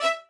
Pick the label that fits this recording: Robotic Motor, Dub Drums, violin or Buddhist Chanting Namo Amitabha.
violin